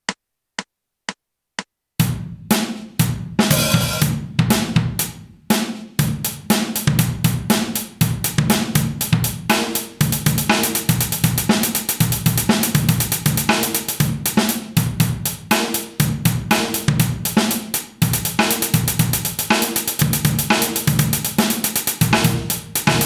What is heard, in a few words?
funk